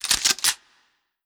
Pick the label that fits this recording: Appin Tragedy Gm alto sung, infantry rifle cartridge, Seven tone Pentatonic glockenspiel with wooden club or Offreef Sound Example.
infantry rifle cartridge